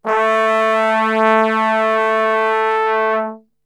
Brass Super Section; Best Service; TRMBSTRGHT; 90 s Sample CDs

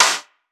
snare lectro; Sonic Foundry (Sony Creative Software); Ugly Remnants VOL-2; Beatstuff

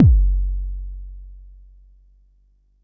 Bassdrum; Jomox Xbase09

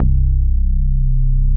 SH 101 BASS; BASS SOUNDS

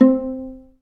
Vla Piz C3; Digital Sound Factory Virtuoso

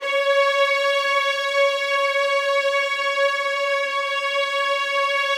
violins, C#4; Best Service ProSamples vol.51

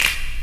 SNAP; Best Service; Dance Mega Drums; SNAPS

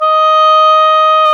oboe, Oboe Brth

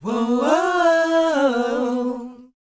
WHOA B, Vocal, R&B & Dance; ILIO; Vocal Planet

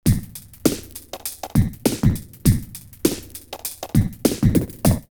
Hole 2 Hole 100, drumloops OldSchool; X-Static Goldmine

